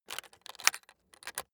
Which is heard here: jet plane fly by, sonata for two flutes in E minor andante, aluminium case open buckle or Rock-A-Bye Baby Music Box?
aluminium case open buckle